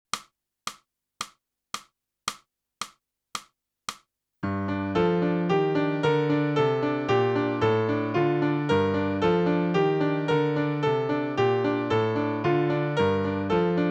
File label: clarinet; boosey method